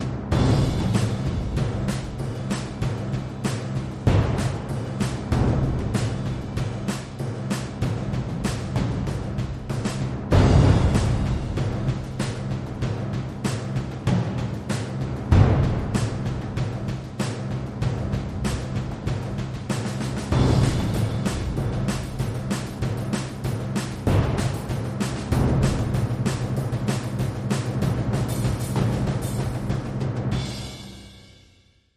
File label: War Drums